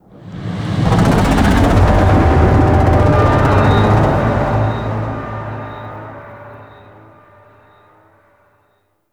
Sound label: Void Dragon Vocal Distant Idle; Enemies; Vocals